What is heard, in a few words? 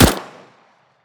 Cz75